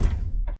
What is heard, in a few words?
footstep jog; Titanfall 2